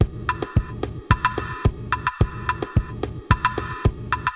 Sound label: 80s Gated Verb Korg MR-16 (110BPM), cyborg beats